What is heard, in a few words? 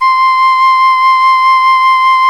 SULING VIB; Exotic Winds